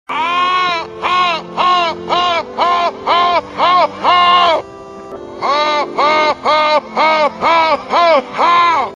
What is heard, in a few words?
laugh